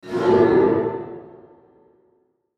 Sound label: Metallic Stab Scrape Swell Reverb; Metallic Sound; Blastwave